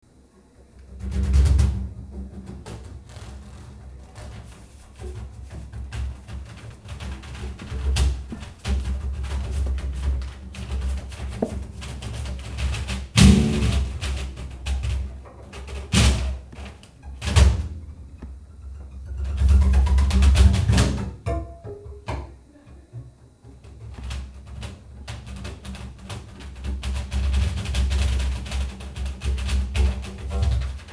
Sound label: TREE bass